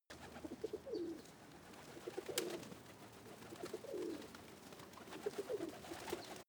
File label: pigeon coo